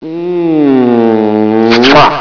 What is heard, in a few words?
smooch